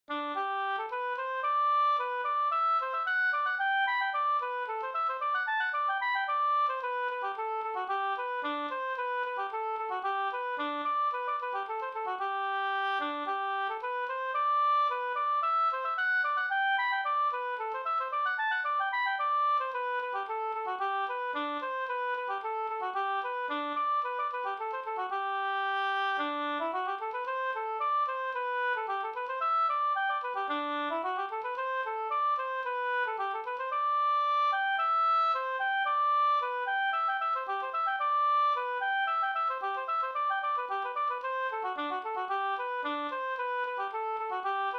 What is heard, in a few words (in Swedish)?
skanklat